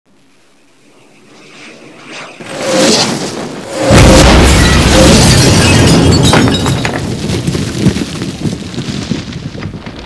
arty hit

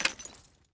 gewehr reload clip out; weapons

